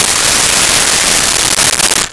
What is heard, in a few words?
Jig Saw; Tools; 1001 Sound Effects